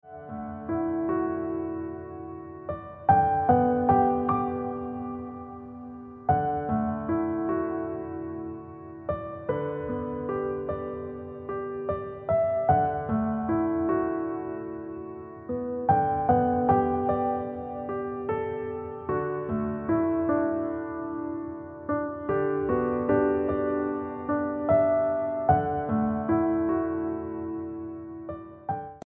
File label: Ringtone Piano Music